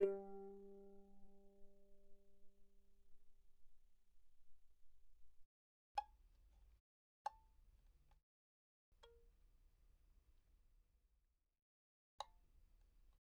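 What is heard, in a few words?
Violin pizzicato pianissimo sul G G3 B3; Strings; violin2012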